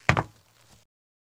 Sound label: FS Runner On Metal, singles metal